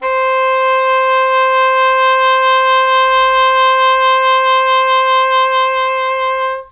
EH C5, ENGLISH HORN; Q Up Arts; Denny Jaeger Private Collection

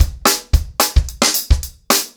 110BP, ACOUSTIC DRUMS; Sonokinetic - The Roots Drumloops

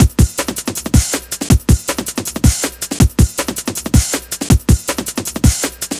160, Drum Loops; Total Drum&Bass

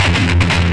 GTR, Distortion Gtr; PlatinumPhattCD2; E-MU Producer Series Vol. 8 – Platinum Phatt

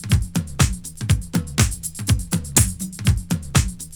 TOM TOM; Best Service Hyper Dance

